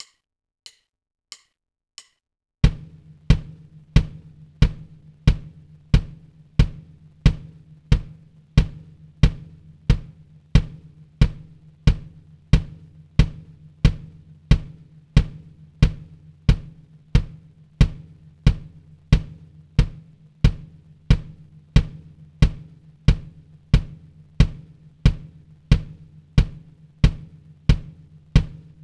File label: Music Class Test Drum; bully scholarship edition